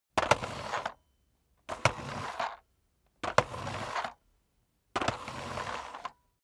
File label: vacuum sweep forward sequence